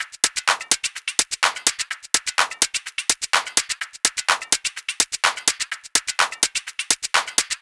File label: 126 top loop simple